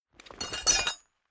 Rolling Out Spanner Tools Set Metal Rattling Clanging; Hand Tools